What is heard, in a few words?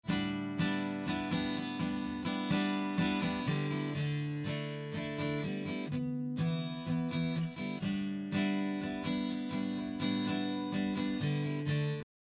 delay fx